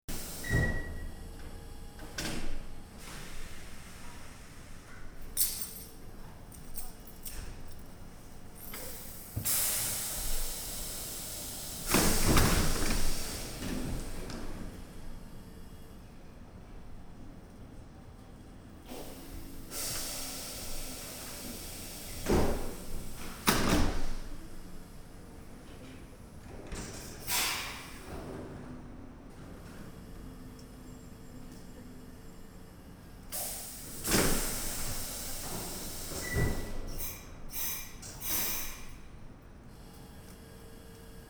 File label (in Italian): in transito Ferrovia Lugano Ponte Tresa Stazione di Ponte Tresa; rumore lontano